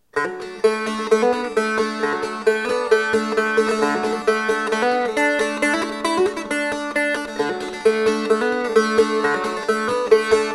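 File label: Dulcijo Unplugged, dulcimers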